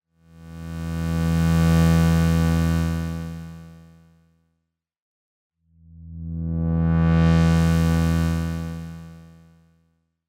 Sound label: EQ Sweep